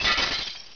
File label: glass, debris